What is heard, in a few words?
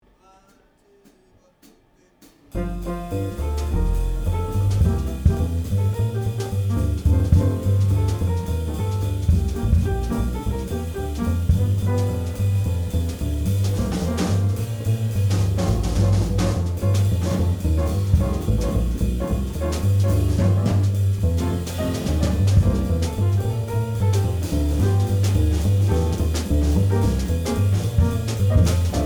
rehearsal